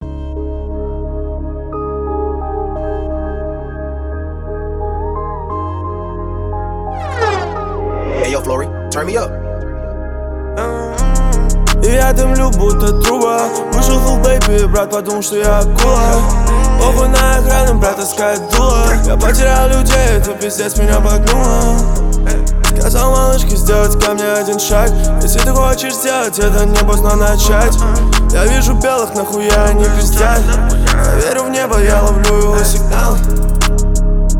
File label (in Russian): truba signaly